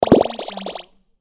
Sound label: retro bleep delay